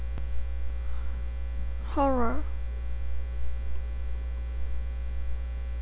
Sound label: horror